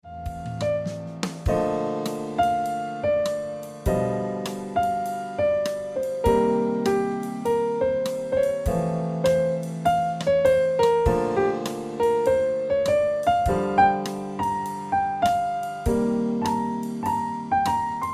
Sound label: Piano Music